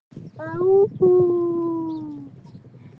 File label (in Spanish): aullido